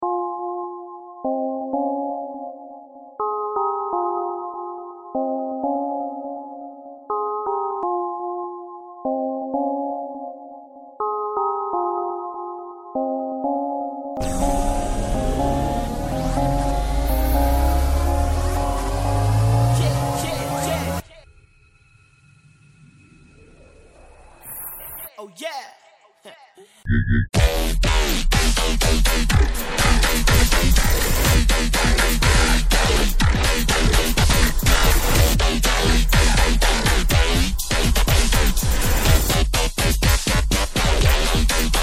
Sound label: Trap Trap Trap BOOM